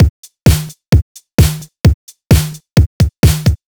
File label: Drums, 130bpm, Nu Dance Electro; Zero-G; Soundclash; Combi Loops; The Teuton